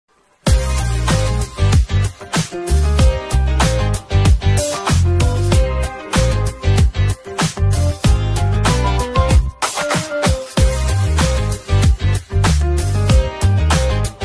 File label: bgm version background music